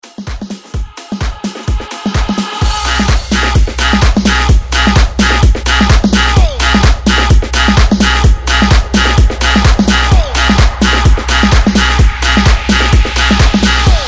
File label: Old School Transition